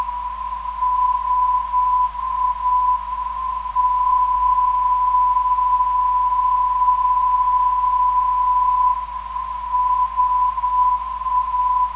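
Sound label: non-directional beacon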